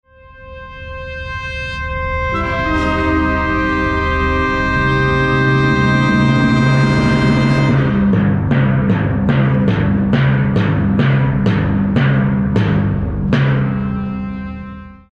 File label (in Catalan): Membranofons